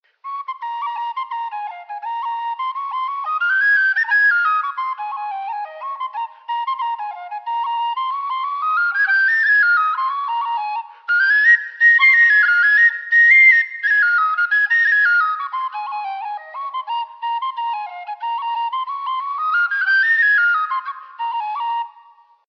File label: Freeman Tweaked Eb Generation Hornpipe, whistles